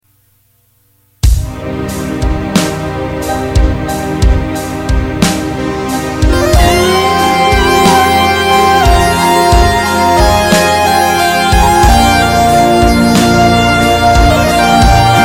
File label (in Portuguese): solo de teclado com bateria